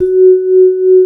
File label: VIBE, ORCH PERC; Roland - LA Composer vol. 1